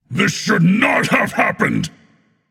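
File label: non-verbal boohoo; smite; atlas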